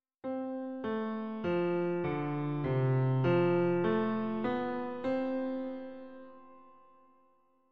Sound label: Variable Dynamics With Hairpin None